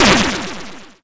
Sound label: YM Noise; NOISES; ChipShop Deluxe; ChipShop Hits